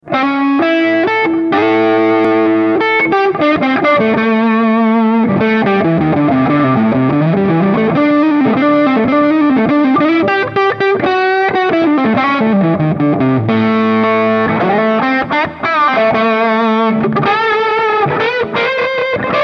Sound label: twango medium gain comp full; Twangophile